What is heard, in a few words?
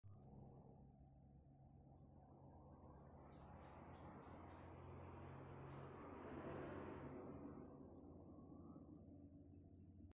stop sound